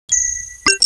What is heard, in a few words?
beep; Babylon 5 - Entertainment Utility